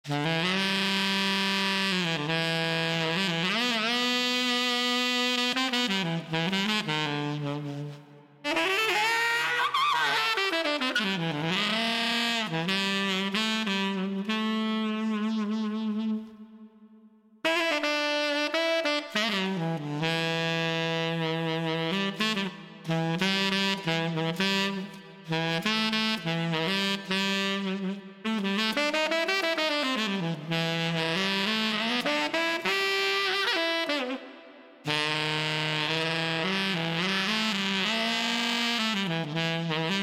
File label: Saxophone; the lego movie 2 videogame macos ps4 switch windows xbox one gamerip 2019